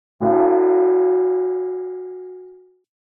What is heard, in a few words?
Metal Pan Impact Hit - Large Baking Tin; Hollywood Edge; sound designer's toolkit v2